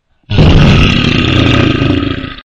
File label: Bear Roar